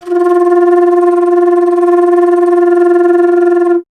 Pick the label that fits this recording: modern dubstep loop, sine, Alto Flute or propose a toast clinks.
Alto Flute